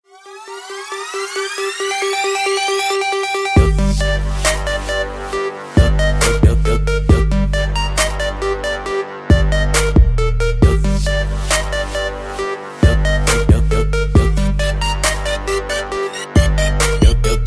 hip hop

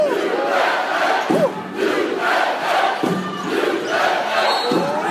UAH UAH UAH

